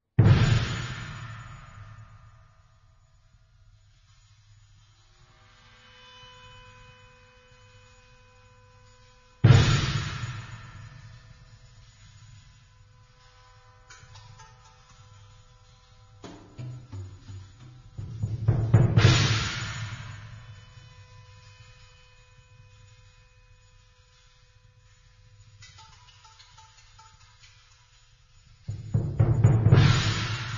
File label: Drumming